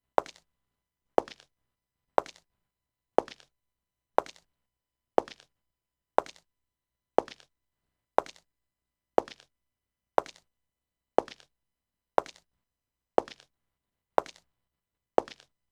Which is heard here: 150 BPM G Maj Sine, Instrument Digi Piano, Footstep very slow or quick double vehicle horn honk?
Footstep very slow